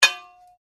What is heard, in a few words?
Blade Heavy Moving Impact, Sword; Foley sounds for melee weapons; Swords (Sound Effects:Combat)